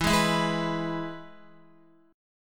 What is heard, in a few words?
E-Major E, Guitar; strummed; JGuitar